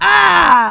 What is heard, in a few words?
pain; quake2